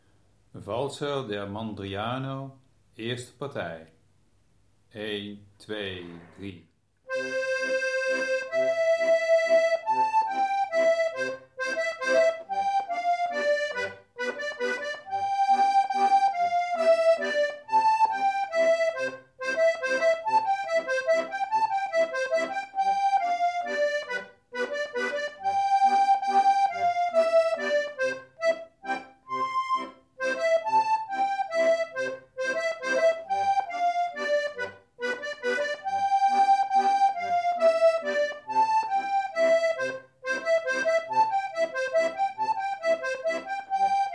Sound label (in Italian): valzer, eerste partij; valzer del mandriano